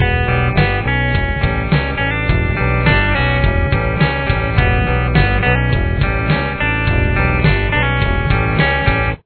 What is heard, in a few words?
guitar and bass sound like together; Verse 2